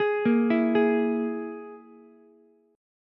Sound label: Ab Suspended 2nd Sharp 5th chord, Ukulele; 13,10,12,11; arpeggiated; JGuitar